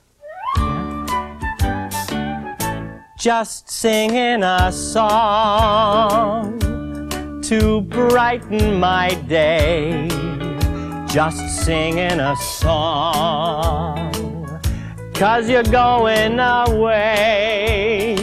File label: just singing a song